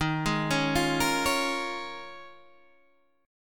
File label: Eb 7th Suspended 2nd Suspended 4th Eb, Guitar Open D